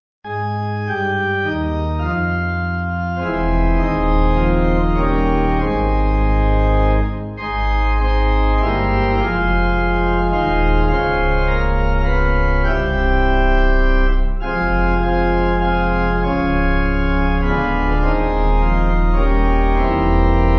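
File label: Pipe, Pipe Organ; God Of Our Fathers; Lest We Forget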